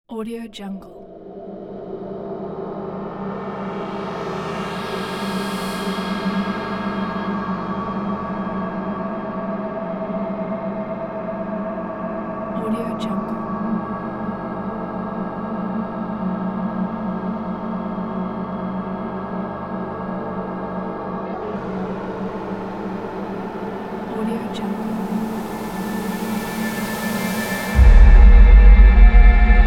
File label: mystical atmosphere